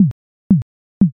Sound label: slot stop